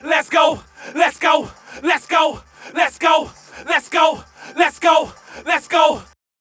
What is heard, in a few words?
VOX; Loopmasters; Keith Mackenzie & Fixx Present Booty Breaks Vol.2